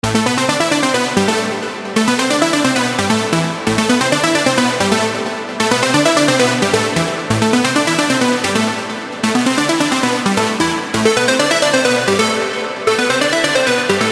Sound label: LD Melodic Techno; Vengeance Sound Avenger 2; Factory 2